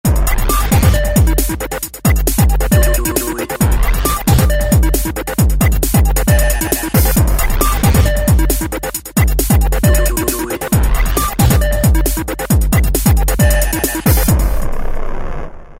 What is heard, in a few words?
Machine Music, Factory Patterns; Korg ES-1